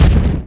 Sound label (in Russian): Strelba, anti air; GroundUnits; Revista CD Expert 45; State Of War